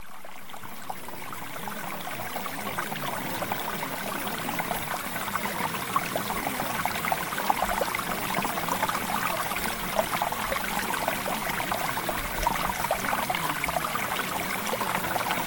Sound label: Mountain Stream